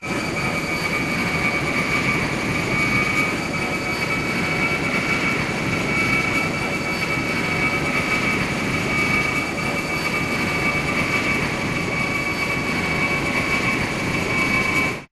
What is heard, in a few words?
evac hoverext; crow; Titanfall 2